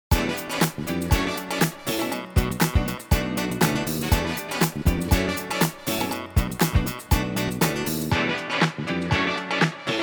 TAPE MELLO FI Full Mix; ARTURIA

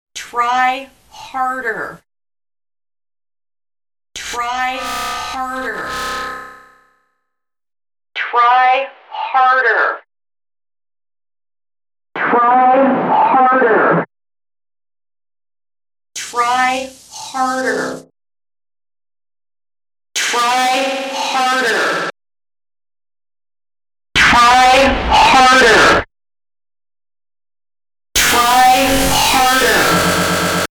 Dist Coldfire Vox; ARTURIA

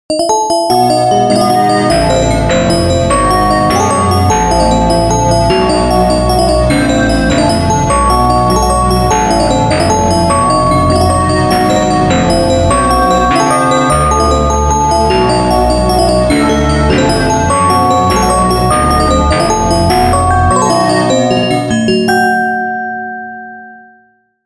Tuningdemo bohlen pierce

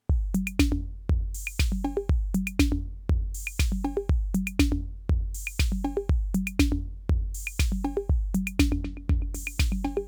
DELAY TAPE 201; ARTURIA

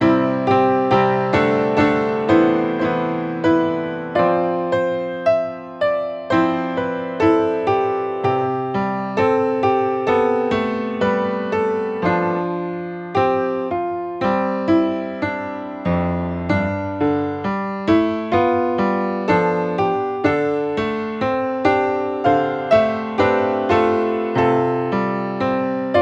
EZKeys Upright

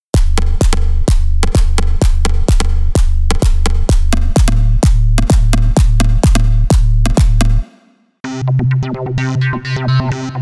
Rhythmic

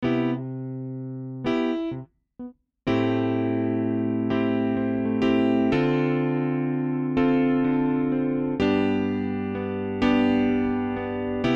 cp70 EZKeys Electric Grand; Toontrack

